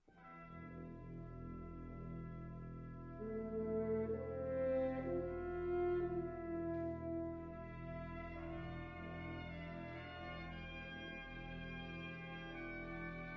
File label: brass